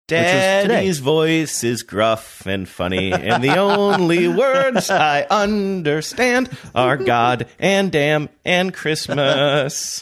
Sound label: singing daddy's voice is gruff and funny